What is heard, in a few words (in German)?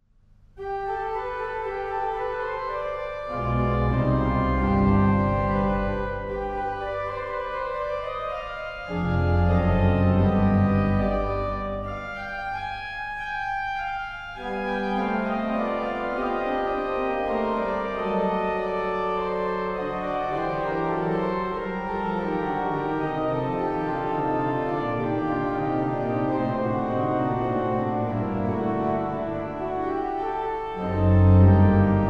Praeludium, orgel; Aulfingen; kulturerbe orgel